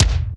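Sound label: BD, bass drum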